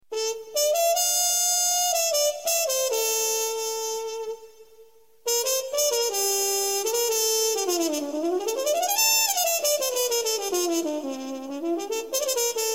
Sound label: saxophone painfull